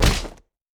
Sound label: wolfman vs stone; LoL; warwick